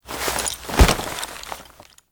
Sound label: Horse Foley Mount; Vehicles; Foley Actions; Warframe; Duviri